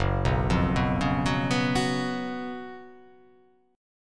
F sharp Major 7th Suspended 4th

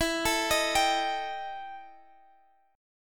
E Minor Major 7th Double Flat 5th; arpeggiated; EmM7bb5; x,x,14,14,16,15

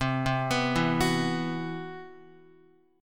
C Major Sharp 11th, Guitar; arpeggiated; CM#11

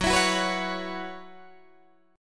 F sharp 7th Suspended 4th, Guitar, DADGAD

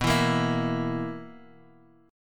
B Major 7th Suspended 4th, chord

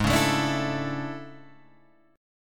A Major 11th G sharp

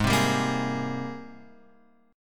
Ab Major 9th, down, Guitar, Open F; AbM9 strummed; JGuitar

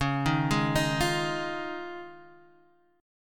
F Minor 7th Sharp 5th Db

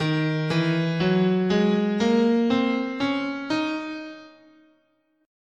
Eb Phrygian sharp 6, scale

